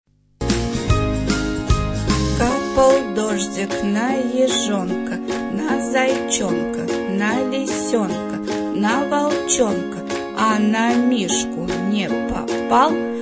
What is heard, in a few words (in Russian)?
Kapal dozhdik